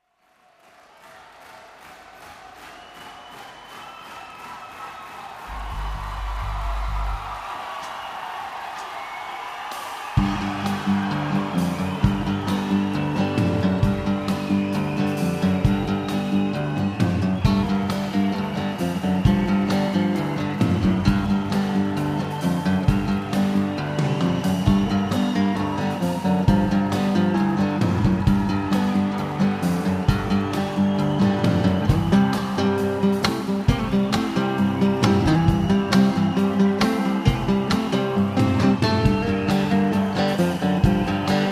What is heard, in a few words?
Guitar Pickers